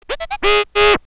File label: TURNTABLES, Hip Hop